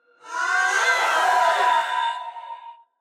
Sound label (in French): Banshee Cri; Phasmophobia